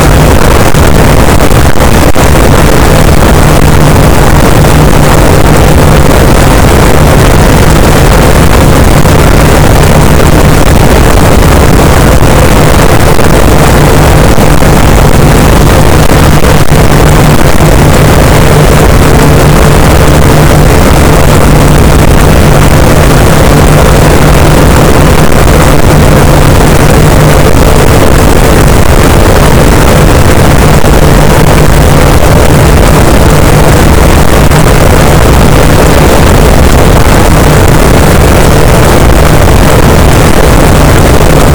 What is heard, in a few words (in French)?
cachalot positif, boat; Physeter Macrocephalus